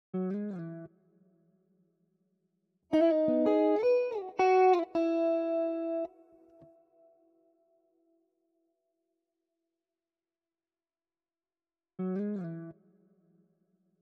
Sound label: 162 BPM E Maj Guitar; Loop Stems; Cymatics; Miami Guitar Pack - Pt 1; GPS